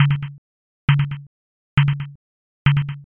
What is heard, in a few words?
fs gym